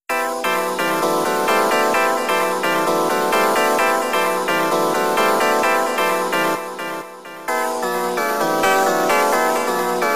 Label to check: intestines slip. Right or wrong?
Wrong